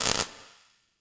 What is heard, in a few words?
Electric Spark; Incidentals; Mace Griffin Bounty Hunter; XBOX